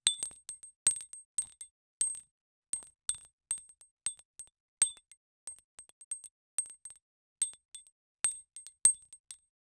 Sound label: a collection of short sub, bottle